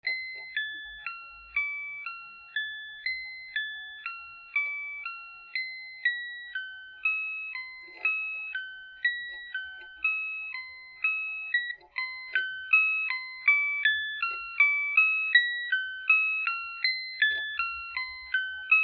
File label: alarm